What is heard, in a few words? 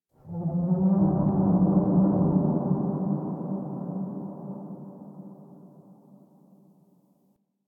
Moan Exterior, Ambience